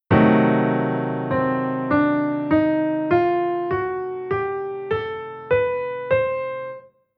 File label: Chord Chord Scale Dim7